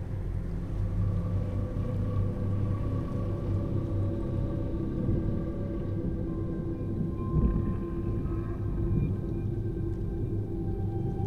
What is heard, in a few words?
ambience General; asgardian stones